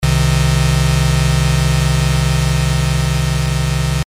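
Stereo Panning and Movement Fast, using a fast square wave LFO to modulate the pan position can create a more dramatic, back-and-forth effect, adding a sense of tension and excitement to your mix.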